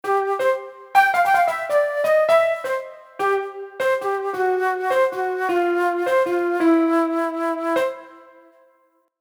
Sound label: Piano n Flute